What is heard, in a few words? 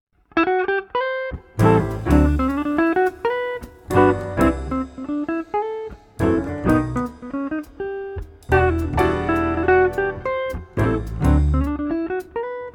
jazz quartet piano bass drums guitar; acoustic guitar